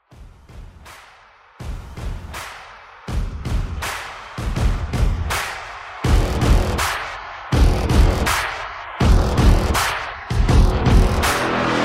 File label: Intro Drum